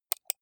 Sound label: computer mouse right click